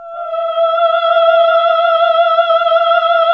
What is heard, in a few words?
Nuns Ooohh; NUNS AAH OOH; Sound & Vision - Gigapack II CD 1